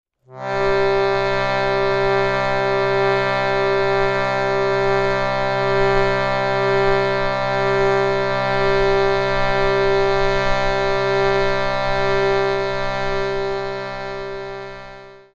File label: Shruti Drone C G